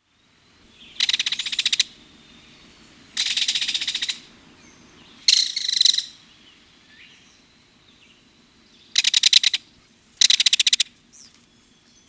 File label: Belted Kingfisher